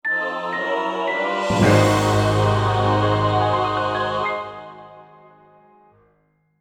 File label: Fanfare Item; "Get Item" fanfare; BOTW; Breath of the Wild